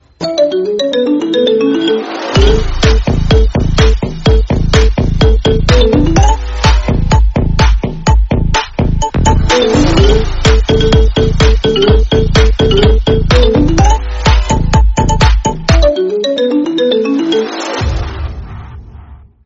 Nhac chuong Nokia Remix

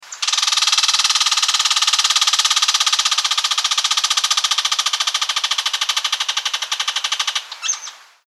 tiếng sóc kêu

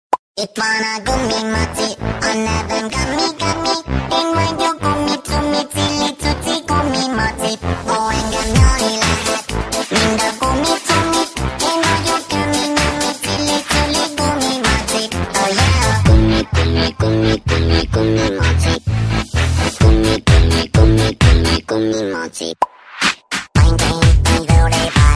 nhac chuong hay remix cuc cute

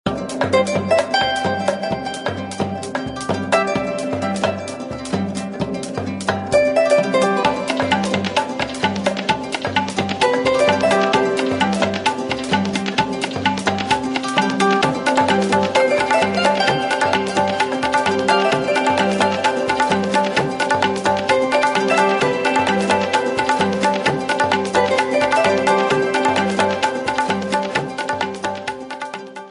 Htc Rhino vui nhon

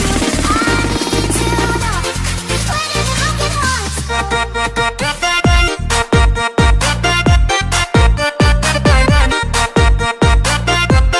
Nhac Chuong DJ Broken Heart Beat Remix Tiktok

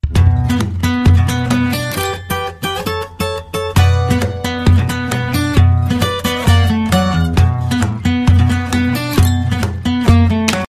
guitar phong cach moi

